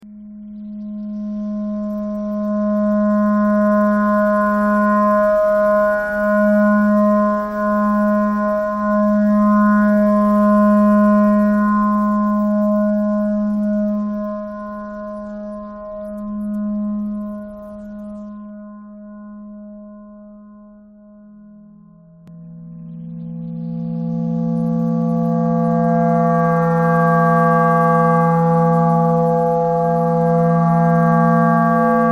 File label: clarinet thread noise